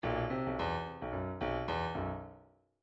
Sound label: midi grooves rock